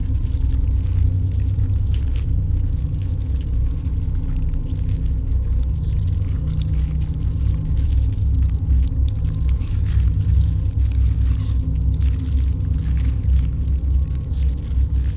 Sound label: ant ship mining station idle; biogenesis